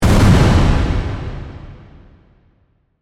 Hit Hard Reverb, Production, Element, Hit, Hard, Reverb; Hits; Sound Design